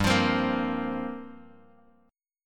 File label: G Minor 11th, Bass Standard; Gm11 strummed; JGuitar